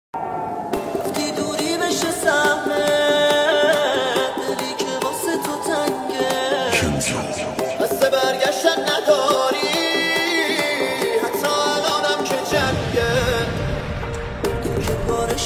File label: music sad remix; آهنگ غمگین گریه آور مخصوص اینستا